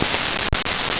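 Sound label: musen, radio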